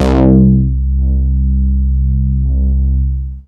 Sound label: Bass Note C